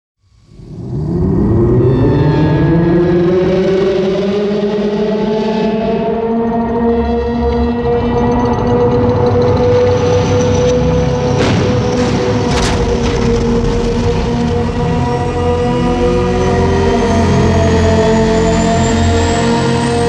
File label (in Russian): trevoga